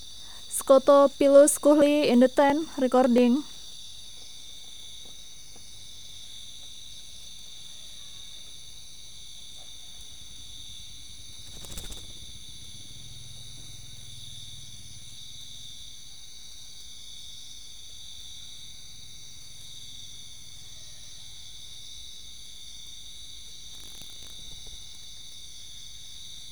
Scotophilus kuhlii

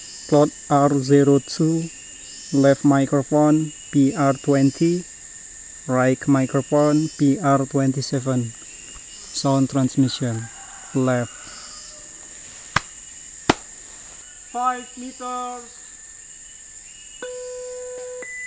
birds; ecoSound-web

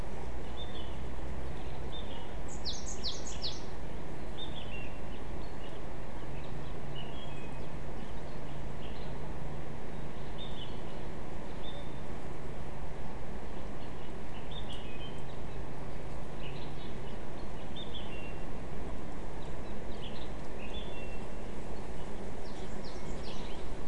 mainly bird cluster

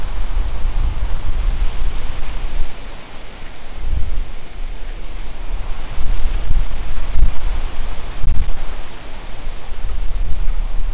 no obvious biophony

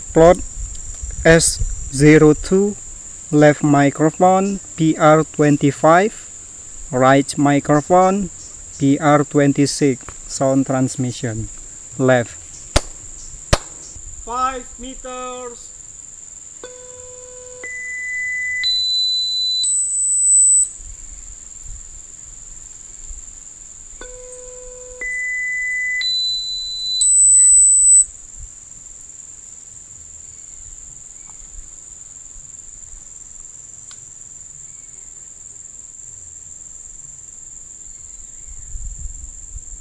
birds

